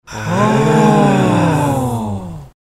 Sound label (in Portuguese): ooohh espanto